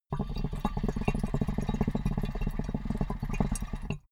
water pipe puff bubble long

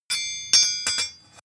sword ring scrape

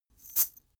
chain mail march single right

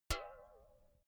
filled can hit ringing long

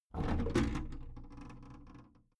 big plastic case lid lift medium

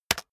keyboard external space hit very fast

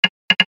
console control

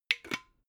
glass bottle massive close cap short

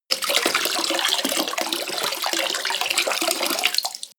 pour and splash hard

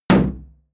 shield sheet impact dent

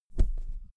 pillow hit mattress

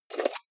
jar plastic midsize open fast

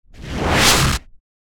short swoosh

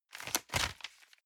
envelope swap hard

